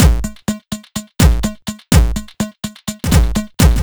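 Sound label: No snare, Drum Loops 125bpm, Nu Dance Electro; Zero-G; Soundclash Nu Dance Electro; Acidized Wavs; Sticky Icky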